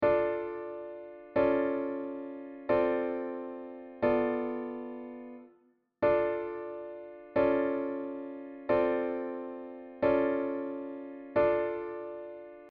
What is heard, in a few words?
Dm Bass Cliche